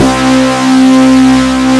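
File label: FUZZ SYNTH, ROCK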